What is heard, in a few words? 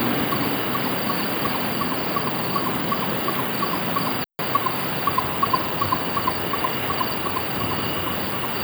golden nightjar, oued jenna